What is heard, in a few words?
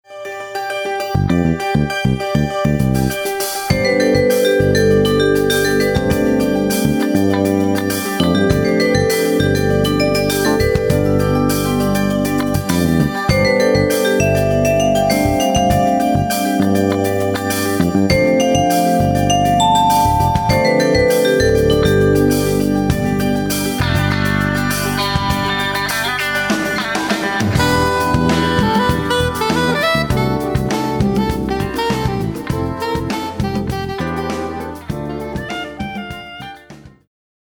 Hot vibes